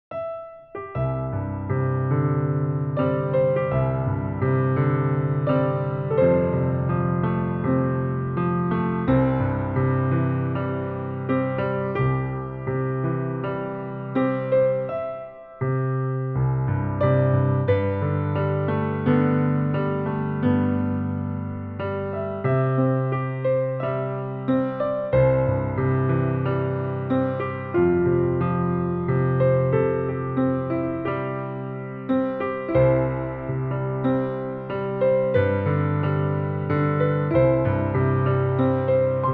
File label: Piano Improv; Amazing Grace